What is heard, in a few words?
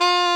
SAX XSAX, Saxophones; All Saxes